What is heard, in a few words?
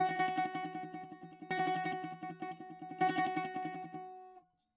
bouzouki